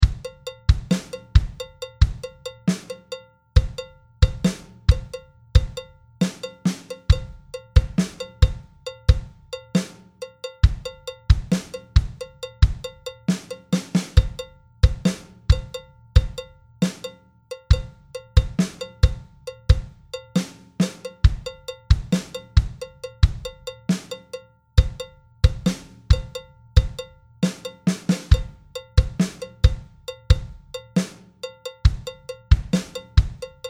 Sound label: nigerian Bell